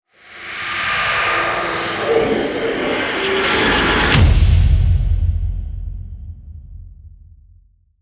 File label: Tension Builder Impact